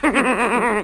laugh satan; ooh ahh

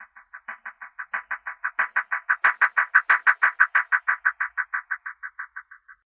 stereo rattle, Rumbles

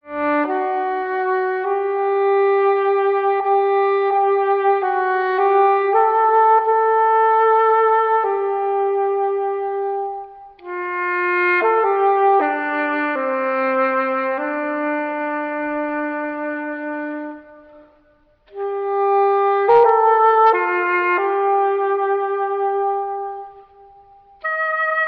sax, sad

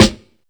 snares; Real

